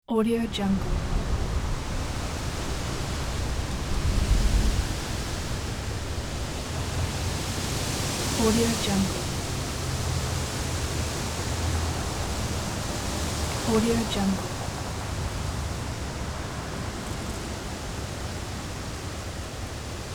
ambience wind trees

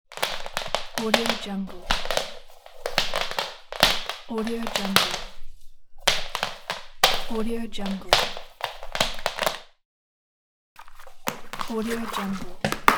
wire drawing; kaelv

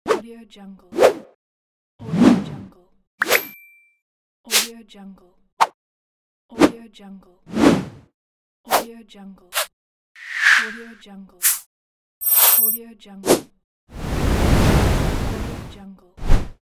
swooshes

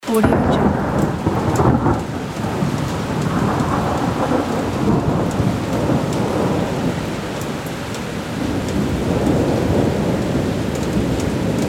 thunder

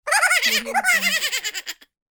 laugh

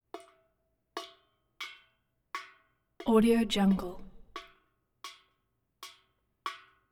water drip single drops into tin bucket

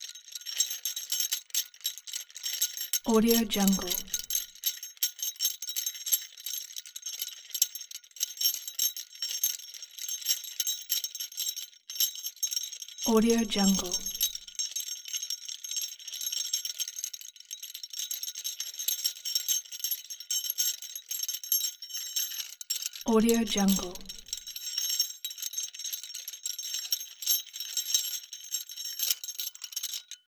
pitched just chains rattling; soundbits-soundfx

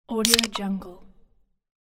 opening beer can

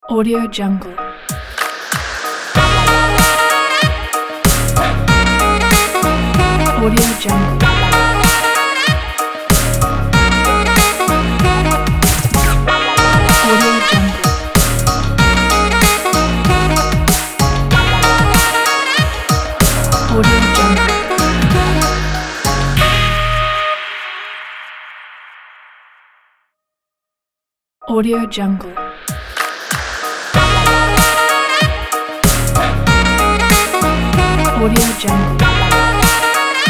modern upbeat intro ident

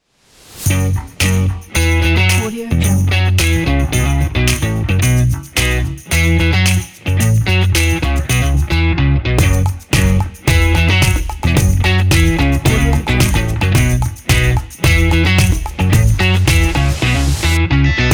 funk

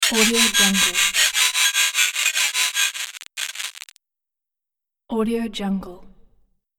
pulse glitch transition

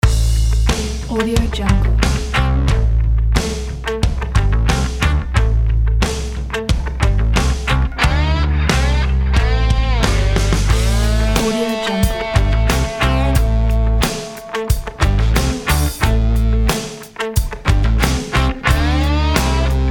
rock stomp podcast intro

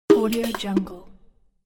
percussive score notification

